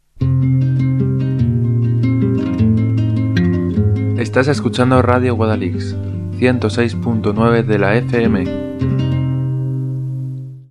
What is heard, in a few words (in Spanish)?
cuña guitarra; Indicativos